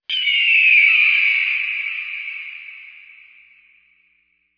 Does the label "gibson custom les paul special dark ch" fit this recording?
No